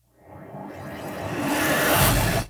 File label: explo car cast